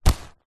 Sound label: leather handbag or pouch grab